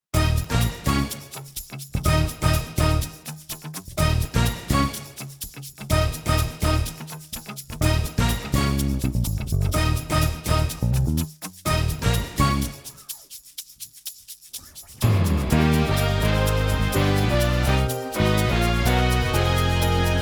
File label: Drumless; loop clicktrack